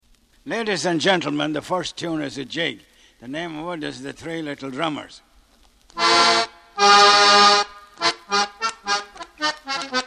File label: Jig; The Three Little Drummers